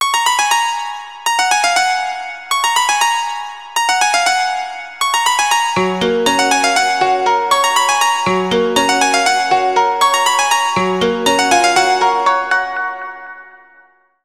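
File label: phone ringtone; X1 Family 2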